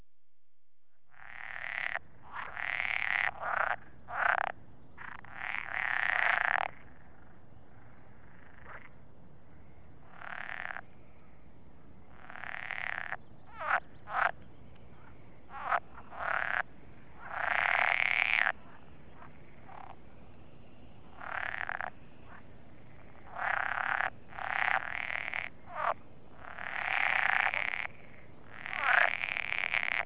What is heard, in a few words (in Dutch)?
poelkikker